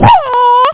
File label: scratch; supr doom